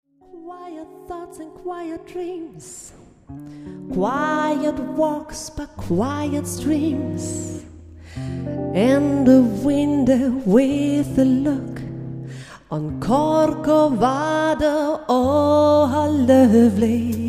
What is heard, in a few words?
saxophone affairs